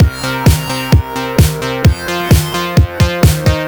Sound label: 130 bpm full, combi loops, nu dance electro; Zero-G; Soundclash; The Teuton